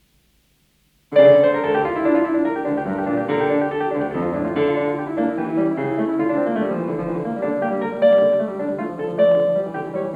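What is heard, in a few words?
Prelude in D minor, piano; Bach; WTC, Book 2; Prelude No. 6; BWV 875; FISCHER, Edwin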